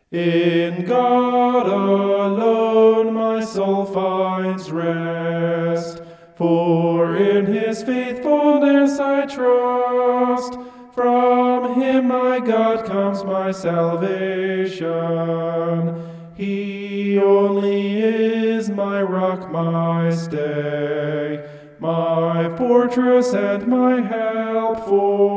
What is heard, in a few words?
vocal homoph; psalm 62